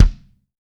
BDV2 -L, Drums Acoustic; AKAI; 90s sample CD; USB Soundscan vol.10; RAW KIT 5

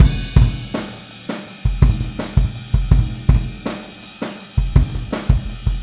Boogaloo Chorus Kick, 82 BPM; Drums from the Big Room The Mixes

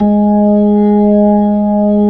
B-3 G#4, B-3; Keyboards of The 60's and 70's

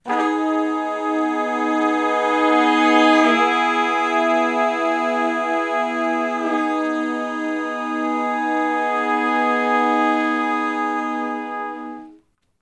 Slo Minor Blus Chords (I,IV,I,I) (Ab); Sections; Sonic Foundry (Sony Creative Software); Horncraft for R&B

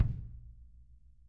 KICK R

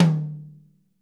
mid tom

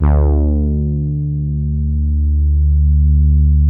POLY HARD D2, SOFT BRASS; Music Store - Claudius Diemeter Schwerlos

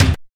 KICK; East West; Phat & Phunky

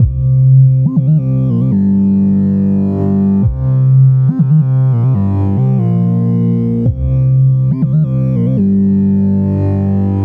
Synth Bass

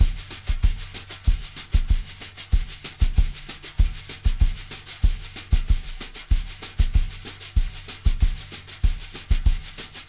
BRUSH LOOPS, 95 BRUSHIN

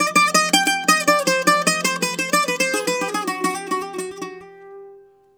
BOUZ C-1, bouzou

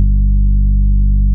MICRO G2, ANALOG BASS; Music Store - Claudius Diemeter Schwerlos

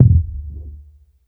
IN HOUSE LO, bass; Sampleheads; Ultra Freakin' Bass; IN HOUSEBASS

